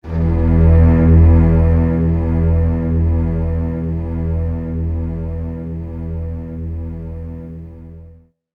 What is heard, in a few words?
Drama, Keyboards; Sonic Foundry (Sony Creative Software); World Pop